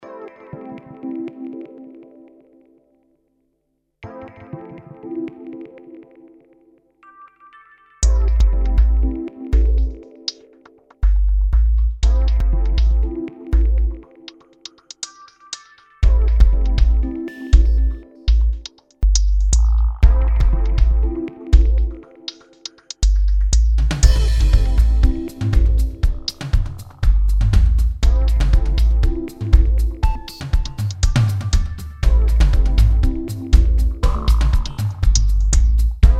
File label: electronic